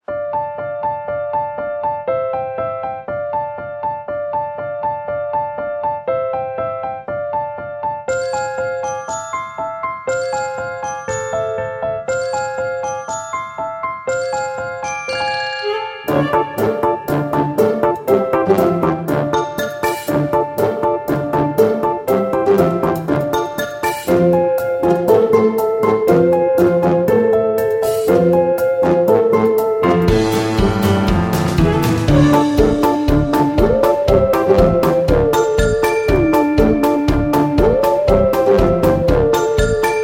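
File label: Halloween music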